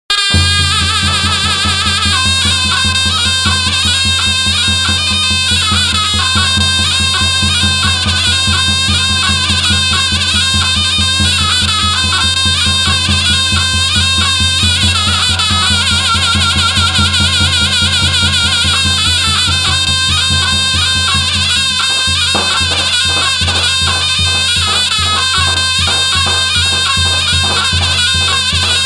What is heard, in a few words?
Zurna